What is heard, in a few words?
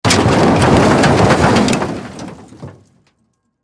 Crash Metal Large Debris Roll Spill Fall; Crash Metal Large Debris Movement Roll Spill Fall; Blastwave